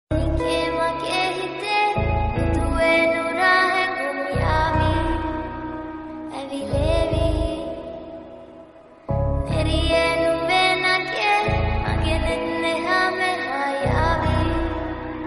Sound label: lofi remix ringtone